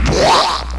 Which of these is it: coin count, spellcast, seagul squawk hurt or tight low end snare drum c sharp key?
spellcast